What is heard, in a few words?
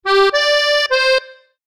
accordion